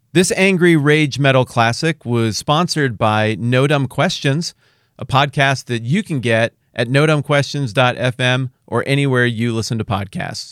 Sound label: Angry Rage Metal Classic; No Dumb Questions commercial; Bumper